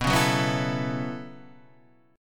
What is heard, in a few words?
B 7th Suspended 2nd Suspended 4th down Guitar Half Step Down, chord; B7sus2sus4